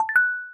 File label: notification